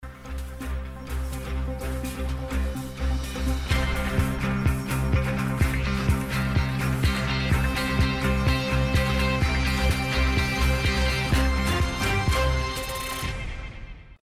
news close

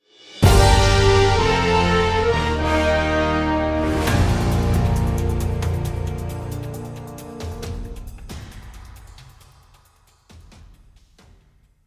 Titles; Sitting Duck Music; Good Morning Britain

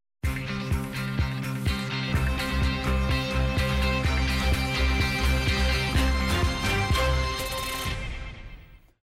news open

